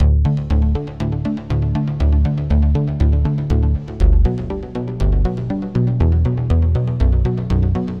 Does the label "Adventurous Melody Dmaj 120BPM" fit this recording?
Yes